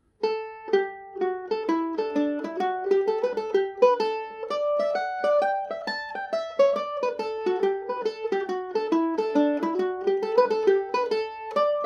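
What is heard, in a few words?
leitrim fancy hornpipe D major, mandolin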